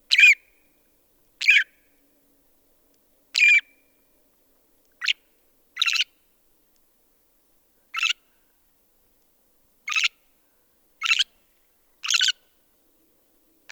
Northern Shrike, Lanius borealis

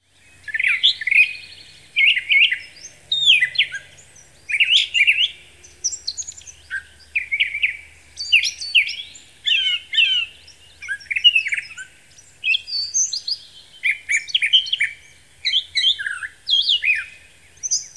Brown Thrasher Toxostoma rufum rufum; thrushes thrashers

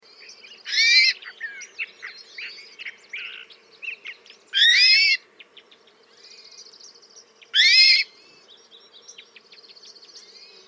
Hermit Thrush Catharus guttatus; thrushes thrashers

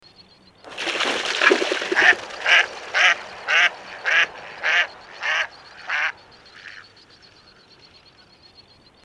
American Black Duck Anas rubripes; waterfowl

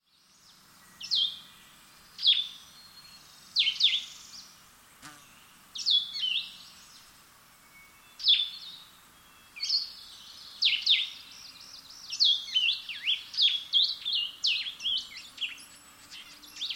Blue-headed Vireo, Vireo solitarius

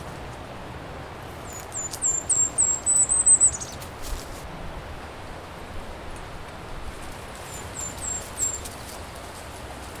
Golden-crowned Kinglet, Regulus satrapa

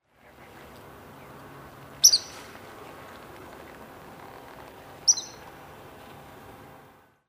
Spotted Sandpiper, Actitis macularius; shorebirds rails

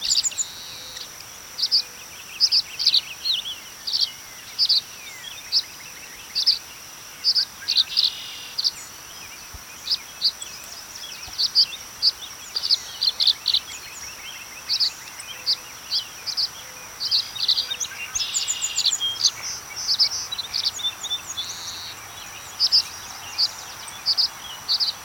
Tree Swallow, Tachycineta bicolor; swallows swifts

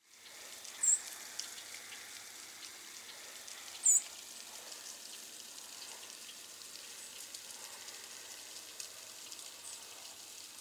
Harris's Sparrow Zonotrichia querula; sparrows

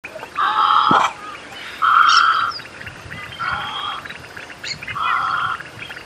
Snowy Egret, Egretta thula; wading largewater

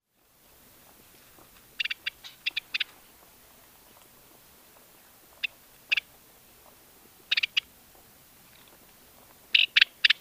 House Wren, Troglodytes aedon

